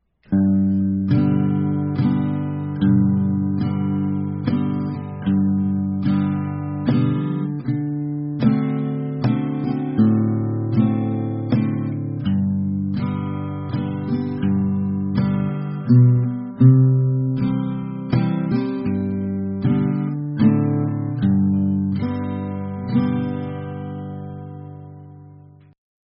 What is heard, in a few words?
guitar